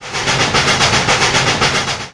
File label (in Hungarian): Mozdony; Pure Pinball